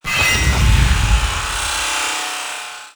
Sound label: Special Sawblade; LooterKings